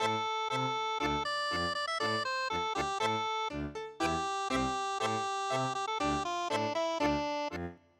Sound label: tango